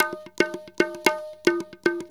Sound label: INDIA L; Spectrasonics - Heart of Asia CD2